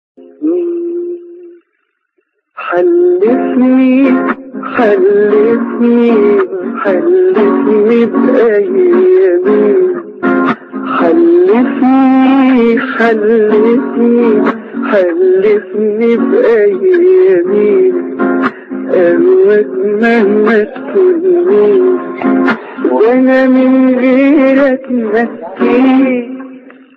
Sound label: solo; egypt